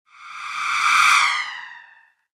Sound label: Whoosh